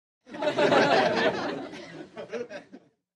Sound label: Crowd Light Laughter, medium